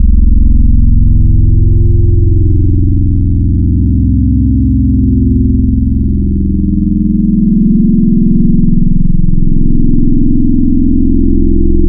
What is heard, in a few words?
Chill Sine Wobble; Synthetic's Serum Collection; Echo Sound Works Core Tables